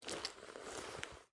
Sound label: generic cloth movement, weapons handling